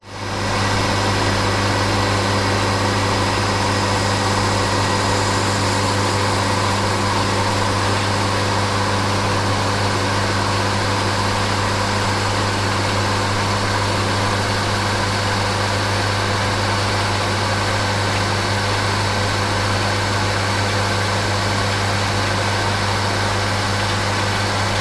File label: industrial pump swimming pool operate whirr